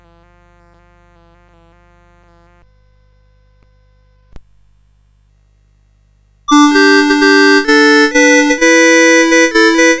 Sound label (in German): O Tannenbaum, CSG8580; CSG8580R5